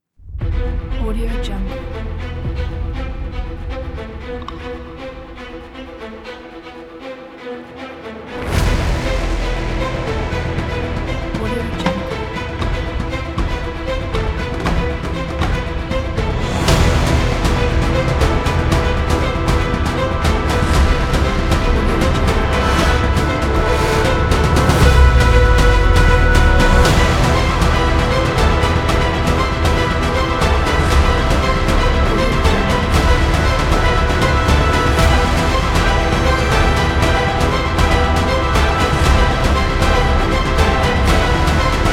fast action epic trailer

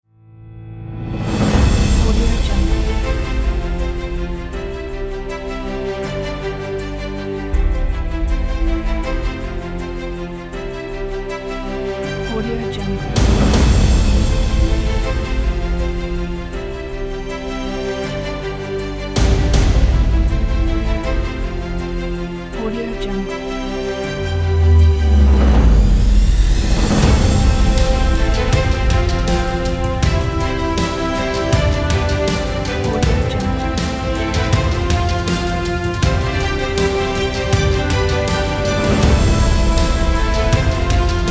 epic

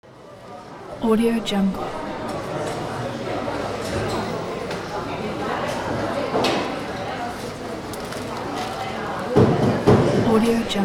crowd in post office hall

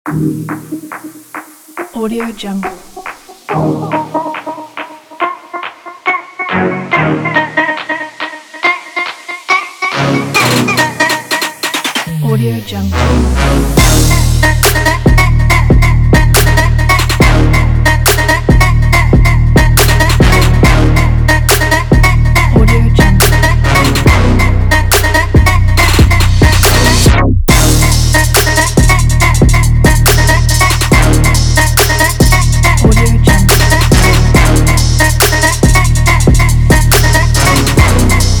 urban sport

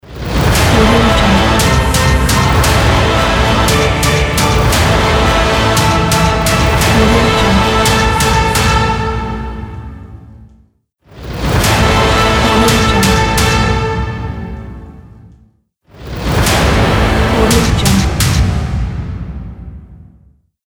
epic logo

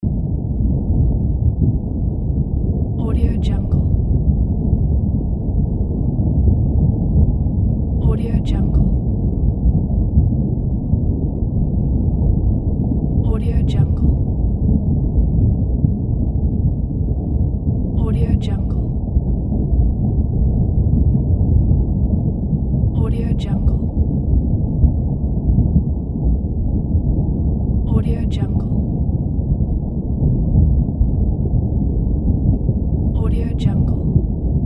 under water sound; mega-loop

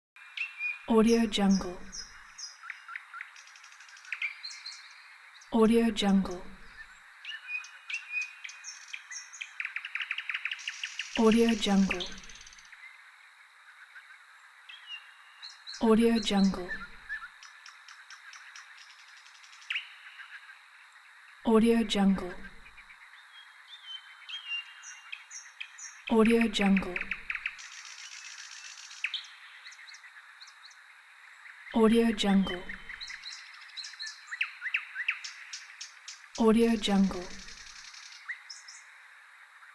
nightingale and frogs ambiance; backupcrew

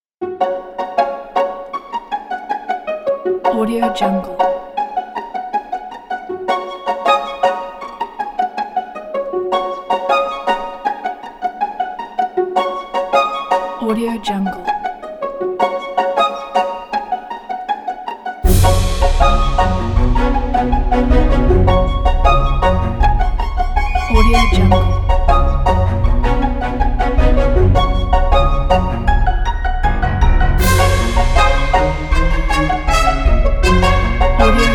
circus clown humor and prank

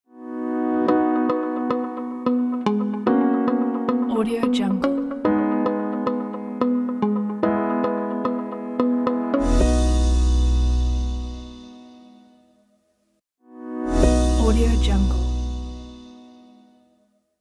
bright corporate logo

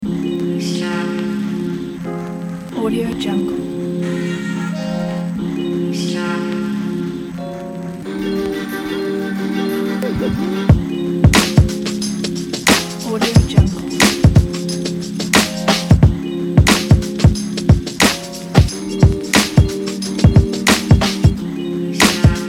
vlog background

